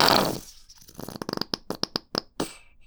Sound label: Plop Sputter, Bottle Plops